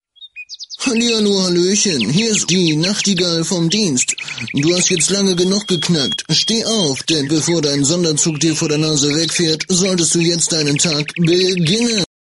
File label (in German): Nachtigall